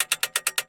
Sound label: Door Chrono Loop; Puddle; puddle plus ios gamerip 2018